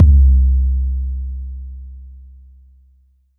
Jungle Kick

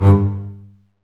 STR CB, Cb Bowed, Cb Marcato; String Master Series

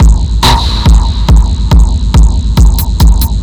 Drums Strange

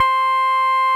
Roxichord C5, RMI E.Piano; Keyboards of The 60's and 70's

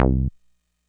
TB303 TRI, ROLAND TB-303 BASS; Rubber Chicken Software Sample Bank

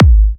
G#, kicks; markus hakala kicks

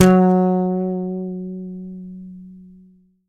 YAMAHA5 SUS F#3, Bass; Sampleheads; Will Lee Bass Library; YAMAHA5 MUTE

